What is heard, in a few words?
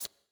menu scroll